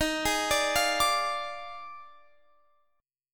Eb Major 7th Suspended 2nd Suspended 4th Eb Guitar Open F; EbM7sus2sus4; arpeggiated